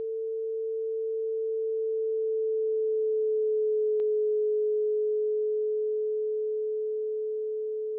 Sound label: doppler